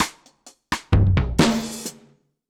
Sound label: Drums B Dry 130, dub drums; musicradar